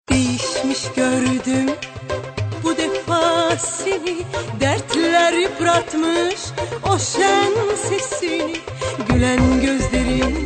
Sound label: Beatiful Ringtone